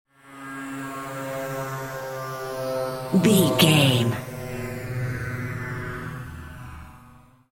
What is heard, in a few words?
ominous war plane heard courtyard